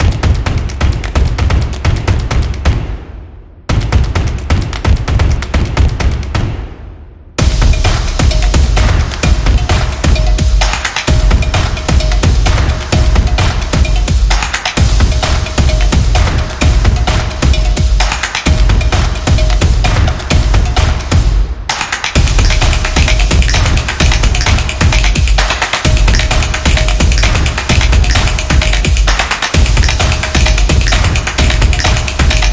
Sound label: Stomps Rhythmic; sports